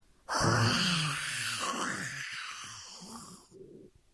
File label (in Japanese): 突風